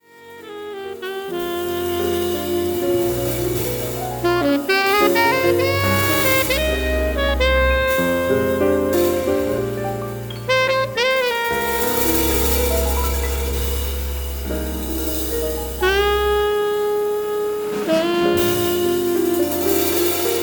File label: saxphon